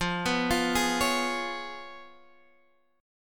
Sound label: C sharp 7th F, chord